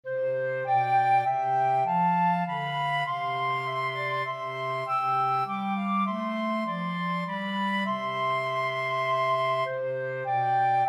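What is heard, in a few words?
woodwind trio, flute-clarinet-bass clarinet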